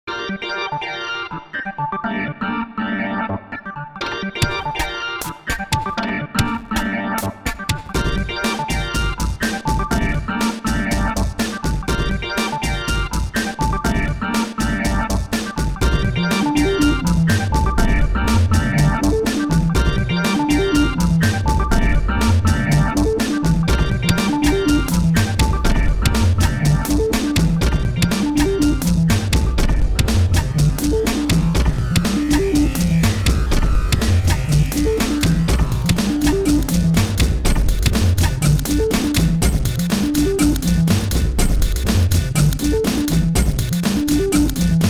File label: Dirty Loop